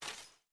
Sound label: Windows Recycle; Scratch; Mine